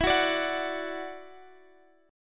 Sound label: E 7th Suspended 2nd, down; E7sus2; strummed